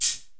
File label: 808 MAR, 480L; Wizoo; Platinum 24 Electronic; 480L BW 808